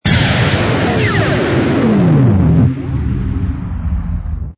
cruise disrupt, engines

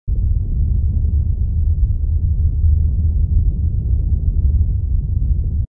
rumble station large; SOLAR; REM-Freelancer; REM-Mod

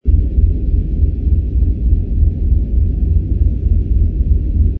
rumble destroyer, engines

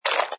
slurp bottle

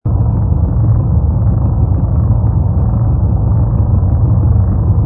rumble freighter, engines; rumble bw freighter

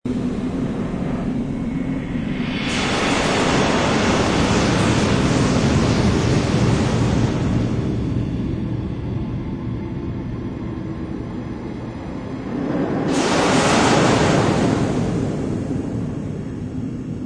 zone nebula barrier, ambiences

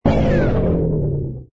engine fighter kill

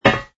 steel

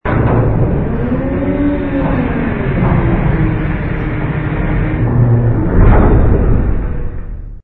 jumpgate close

